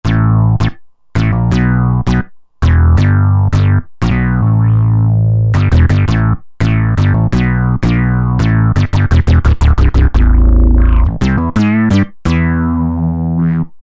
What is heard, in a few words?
Break Bass, Roland JD-990; Factory Patches